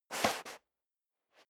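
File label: step dress boots sand scuff, footsteps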